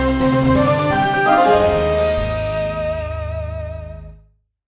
Victory - Duel; prince of persia

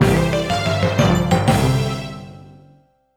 Victory; streetpass mii plaza; warrior's way

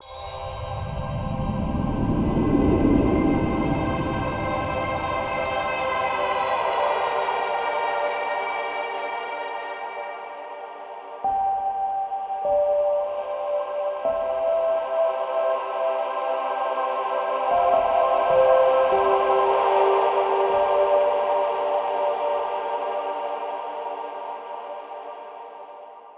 Pad Loop

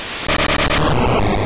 Dynamic Punch

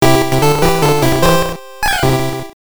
Miss; The Jetsons Robot Panic; gb

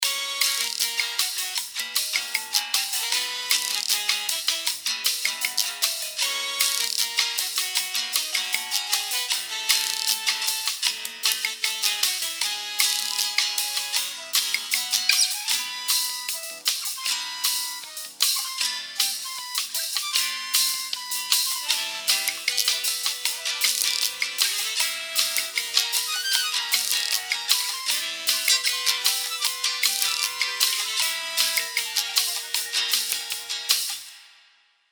Elevator Muzak; Mass Effect

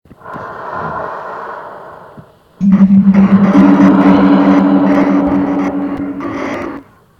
Breathing; puss soundtrack

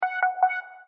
Beacon; yet another pixel dungeon android

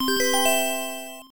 Treasure Chest Opened; PC Engine Super CD-ROM2; Emerald Dragon

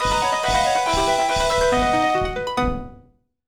Jingle Clear

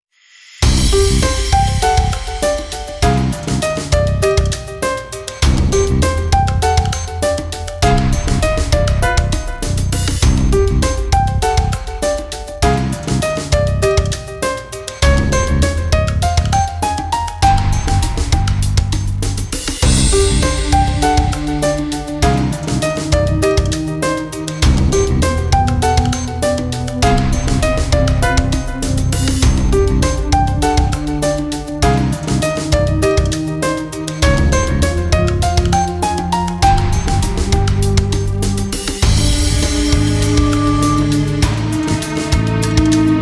Lullaby emotional; Mary Skelter Finale

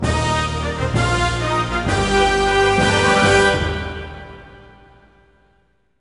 Victory Hit; ps2; Pirates The Legend of Black Kat

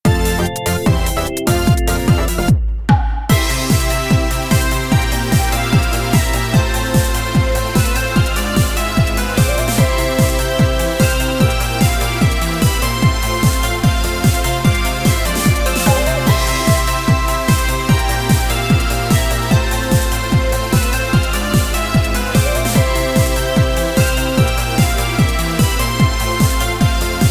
Battle Win

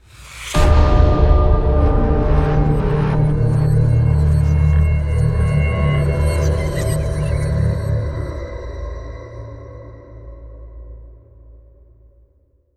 Zombie Round End; Zombie Stalingrad

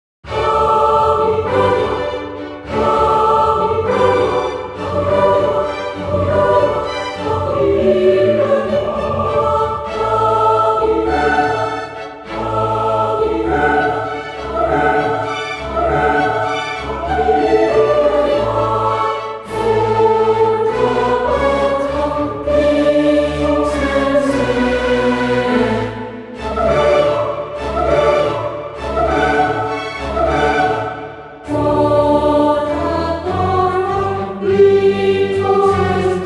Handel - Messiah Hallelujah Chorus; Catherine

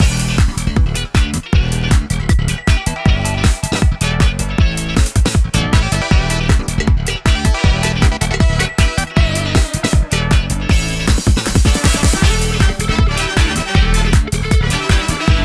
City Speedway Loop lap 3; boom karts multiplayer kart racing android

